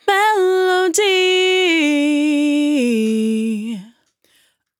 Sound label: 100bpm Bm, Velvet Vocal; Zero-G